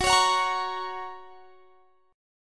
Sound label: Gb Major Gb down; Listen to Gb strummed